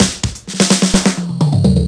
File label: Fills 128BPM, Clubsounds